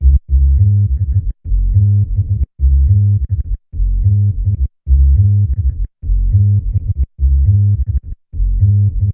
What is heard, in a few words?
subbass; remixable collections; 105 Dibble Dobble